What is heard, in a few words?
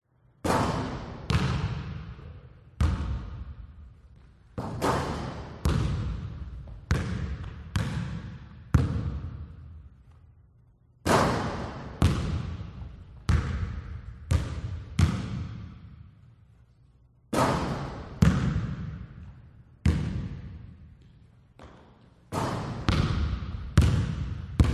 basket ball player solo bounce and shoot empty gymnasium school hall quiet